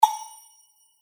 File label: spin Start; Lucha Rumble